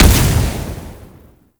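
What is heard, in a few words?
Projectile Impact; Duviri; Incarnon Dera Vandal; Weapons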